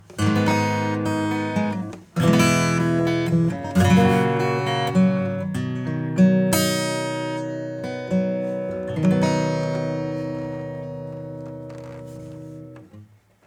acoustic Bright Acoustic; Spectre

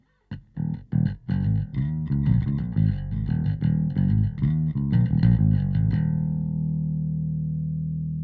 bass Rock Bass; bass preset

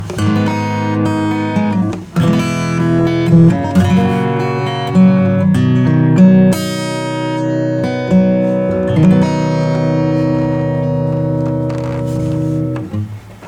high pass 1k; FirePresser TURBO 4

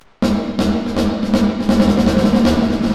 DELAY; ReverberatTS

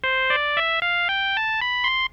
Nord Lead 4 strings

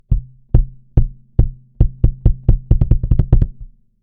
bassdrum bypass

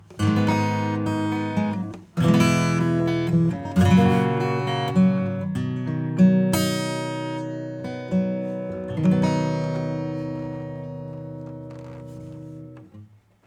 acoustic

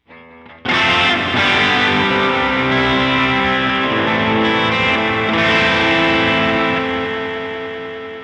Moogerfooger MF-104S LONG TONE MODERN

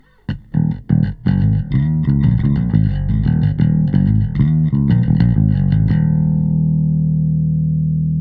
Bass

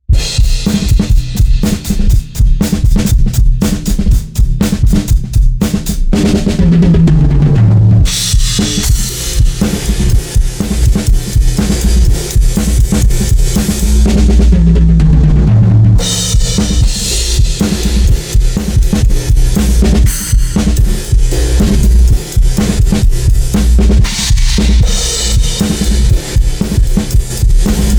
TAPE EQ Low Boost; TAUPE

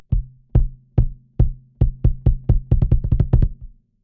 808 kick; SubBass Doctor

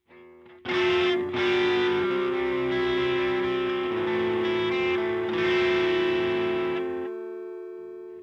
sine; Moogerfooger MF 107S; FREQ. 6

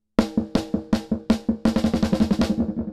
DIGITAL MODE SAMPLE RATE 1000 Hz; Zen Delay

